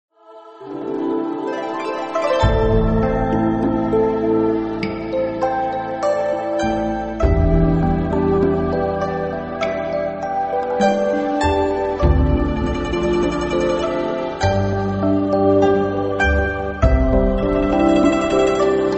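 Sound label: game music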